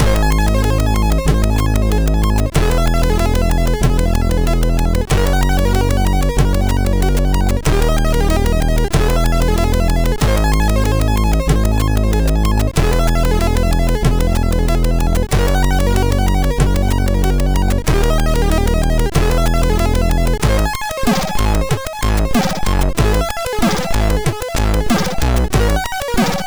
Square, sid MOS6581R2

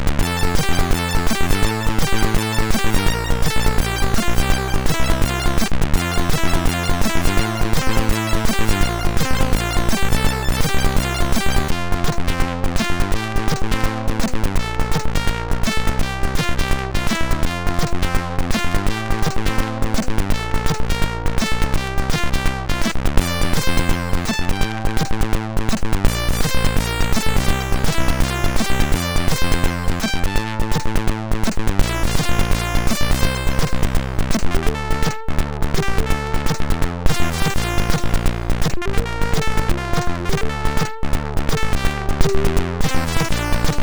Clik Clak, MOS6581R3

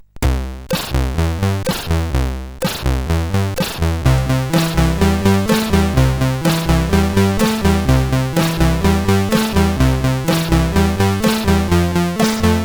Rock, CSG8580R5

